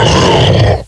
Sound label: Pound Attack